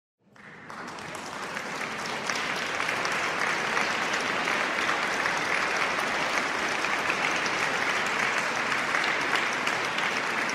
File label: hled male jezulatko